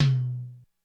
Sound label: Med Tom, Korg DSS-1